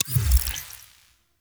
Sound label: UI Mod Screen Open; Warframe; Railjack; Player Ship